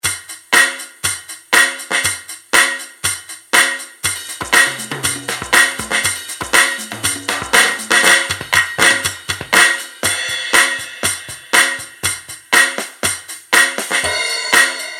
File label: percussion